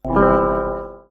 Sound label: bell